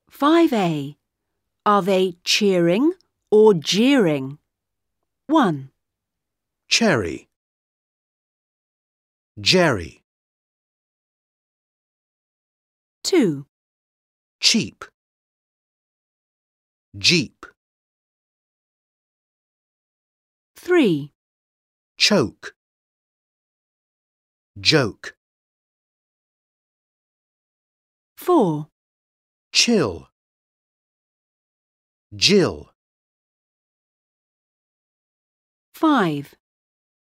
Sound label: Are they cheering or jeering